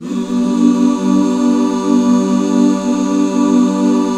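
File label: G major, Back Chords; Sound & Vision - Gigapack II CD 1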